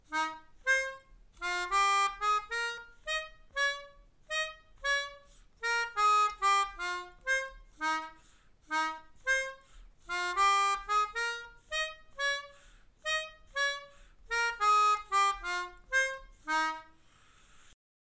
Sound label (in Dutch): Mijn Melodie, Melodica